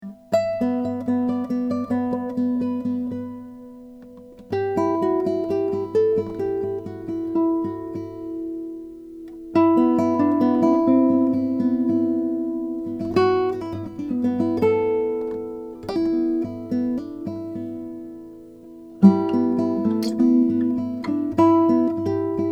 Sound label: claxton concert classical